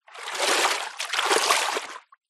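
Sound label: nature water movement splash processed for stereo width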